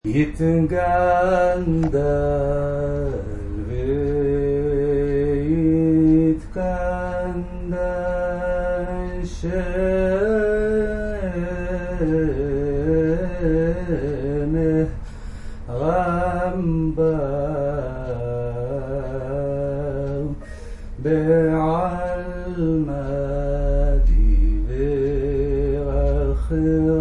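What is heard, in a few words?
Kaddish Ajam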